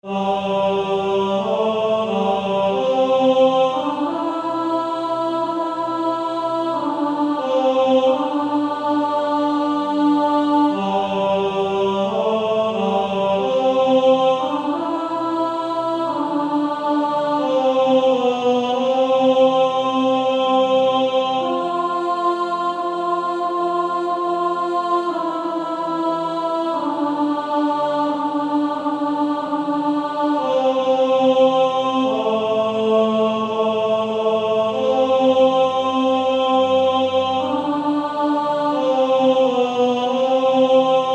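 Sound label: Male Choir, tenor 1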